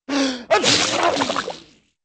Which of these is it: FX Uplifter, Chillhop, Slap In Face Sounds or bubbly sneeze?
bubbly sneeze